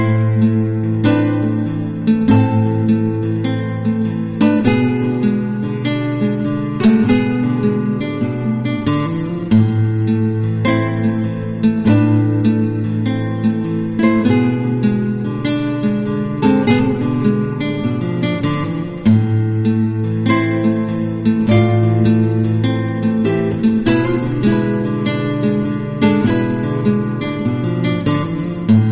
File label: guitar ringtone